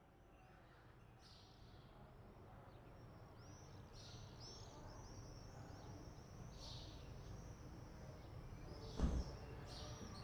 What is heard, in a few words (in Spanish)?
Toque de campanas; en San Antolin II; Ibias